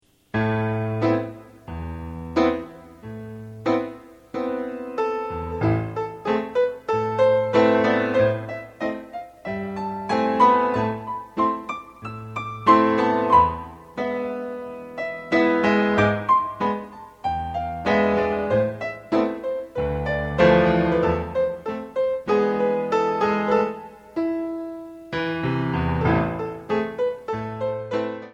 keys tendu

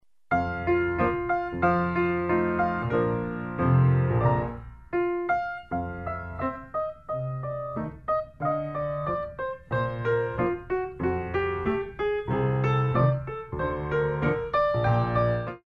frappe

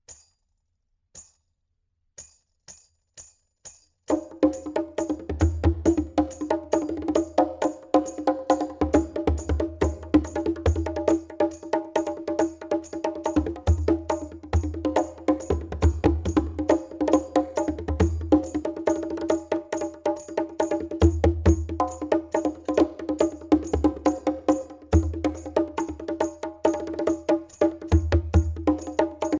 body drum

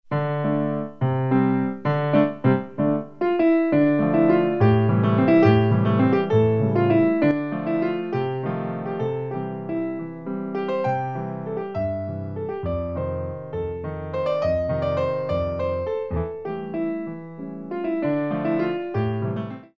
waltz

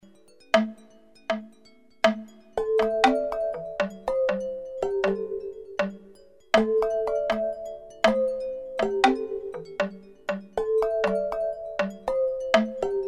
marimba

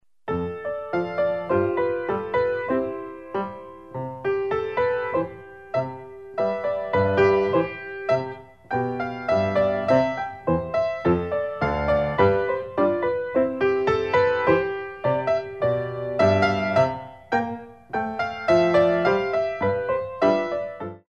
tendu

